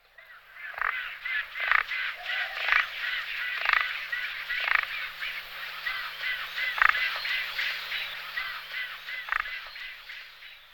Pelikan bily Pelecanus onocrotalus

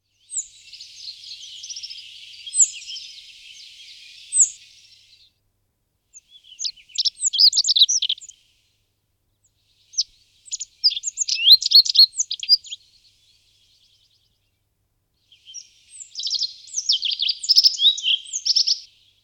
Strnad vinicny Emberiza cia

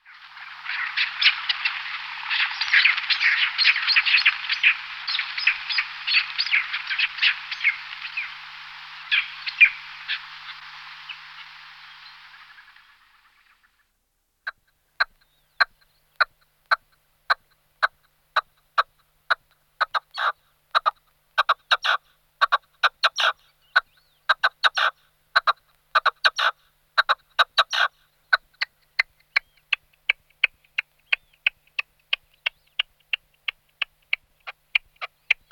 Orebice ruda Alectoris rufa

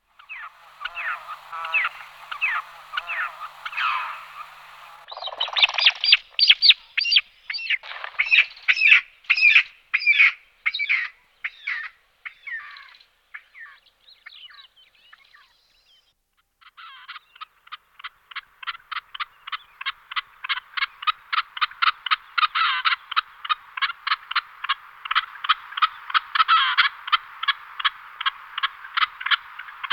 Orebice berberska Alectoris barbara

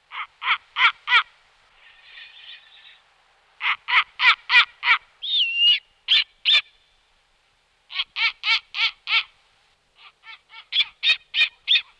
Sovice snezni Nyctea scandiaca

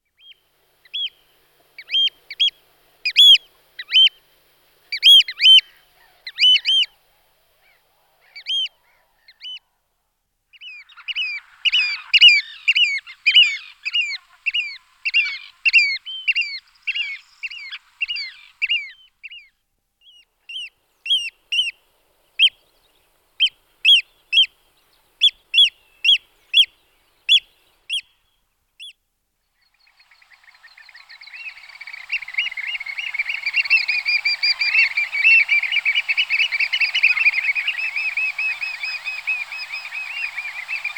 Ustricnik velky Haematopus ostralegus

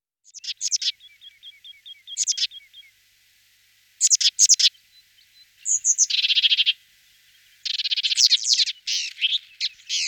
Sykora temna Parus lugubris

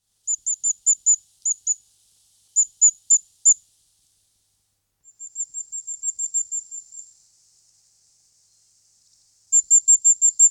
Kralicek ohnivy Regulus ignicapillus

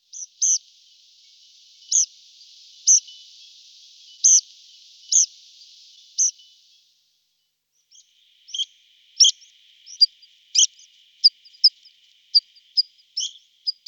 Linduska lesni Anthus trivialis

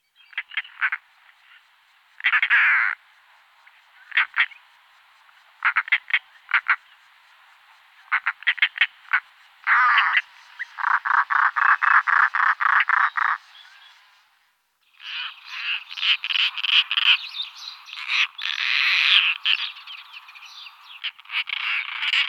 Mandelik hajni Coracias garrulus

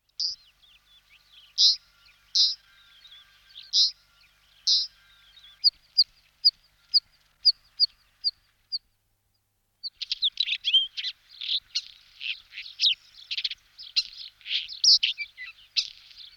Belorit plavy Oenanthe isabellina, nasiptaci

